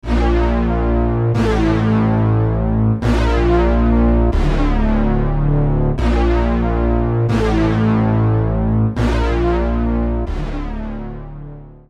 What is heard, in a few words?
sidechaining example synth